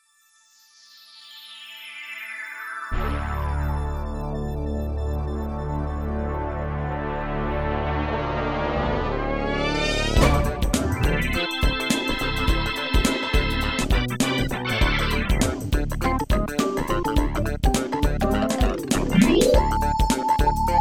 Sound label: funky b